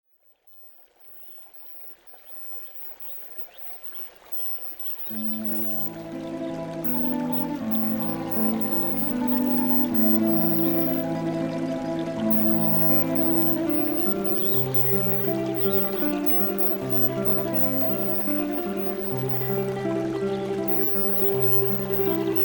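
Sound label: positive change stream subliminal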